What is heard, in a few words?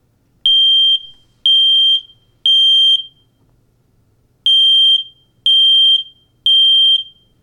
smoke alarm